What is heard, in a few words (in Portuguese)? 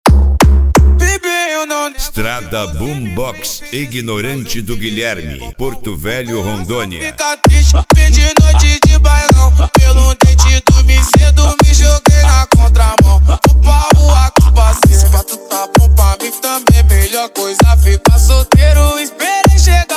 esp funk atack